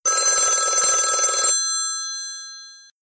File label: US 1920s bell 1 ring normal